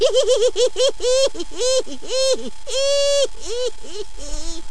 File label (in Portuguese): risada